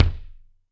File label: Kik Muffled, Drum Machines Alesis D4